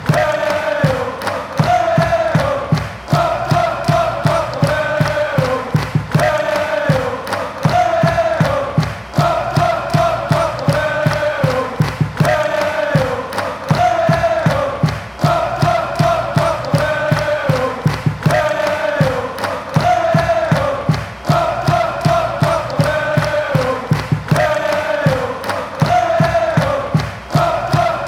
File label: hey oh